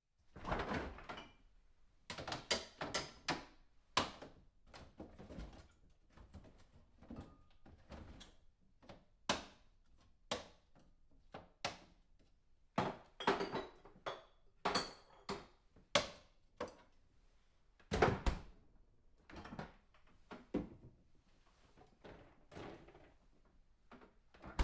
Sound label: refridgerator rumaging